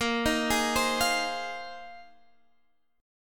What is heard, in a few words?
Bb 9th Suspended 4th Bb down Guitar Half Step Down